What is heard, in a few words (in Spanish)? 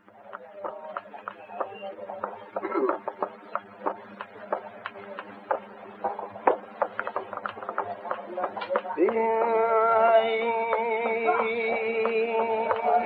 Bulerias; Bulerias para Escuchar